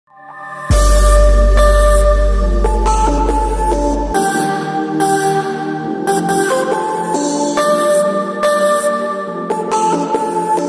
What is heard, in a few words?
Chillstep